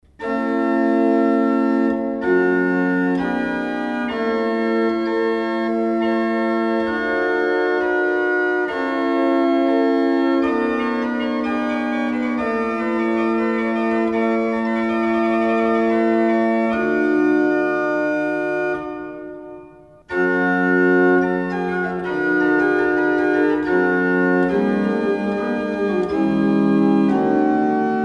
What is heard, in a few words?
organ